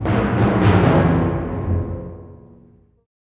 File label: wipeout; Star Wars Episode I Racer